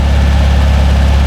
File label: Bug rpm, Engine; Cars; XBOX